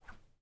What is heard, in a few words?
Sword swing